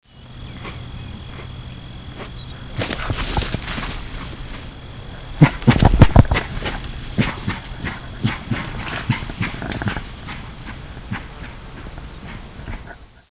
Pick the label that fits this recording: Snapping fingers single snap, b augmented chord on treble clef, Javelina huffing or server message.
Javelina huffing